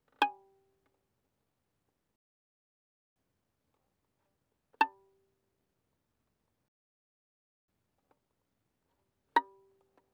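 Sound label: Violin pizzicato mezzo forte sul D Ab5 Bb5; Strings; violin2012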